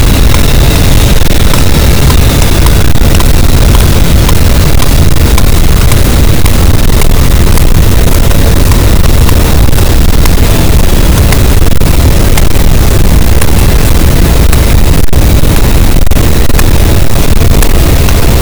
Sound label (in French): cachalot, Physeter Macrocephalus, gaussian noise